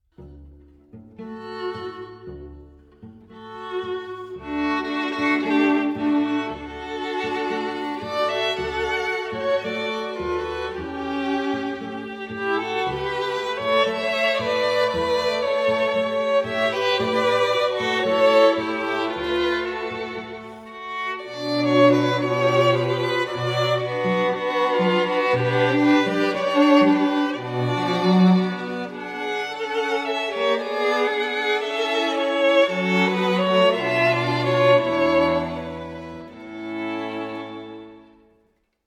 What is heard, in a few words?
Spanish Dance (Tango); Albeniz; Charlotte Strings for Events